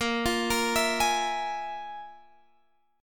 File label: F Minor Major 7th Double Flat 5th, A sharp